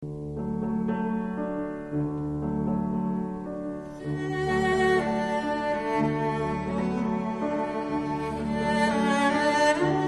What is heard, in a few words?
cello